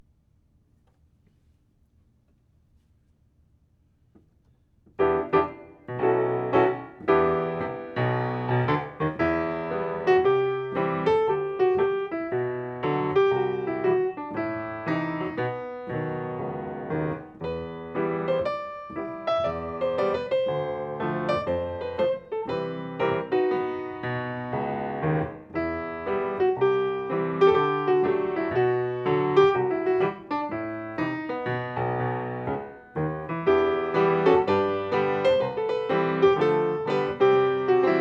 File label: pavanne 113bpm; Faure